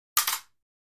Fencing Swords Hit

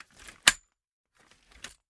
Lawn Chair Fold; seats & stools

